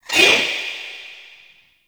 Choir Erupt Proj By

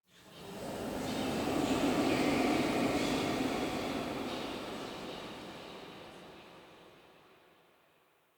Secret Uncovered Whisper Long; Puzzles

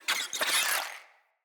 Infested Ashen Vocal Attack; Enemies

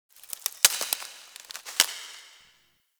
Zariman Foliage Rustle; Ambience; Warframe; The New War

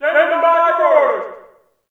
ambient campsite yells; Warframe; Tau

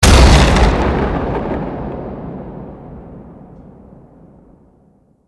Melee Ground Slam; Weapons

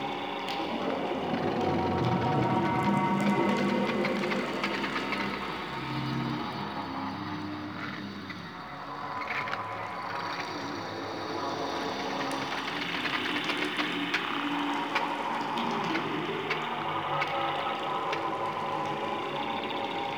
Main Room Ambient Loop; Warframe; Ambience; Chimera

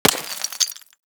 Bullet Impact Debris Rock; Warframe

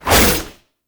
Naginata Whoosh, Weapons Melee; Warframe; Koumei Naginata

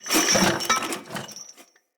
King Death Foley Accent; Characters; Enemy; Mendicant; MndKing

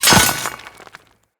Dagger Stone Impact; Weapons; Dual Daggers; Hit Effects

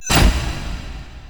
Stairs; Warframe; Entrati; Vaults

